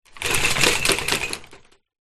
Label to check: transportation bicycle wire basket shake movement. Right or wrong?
Right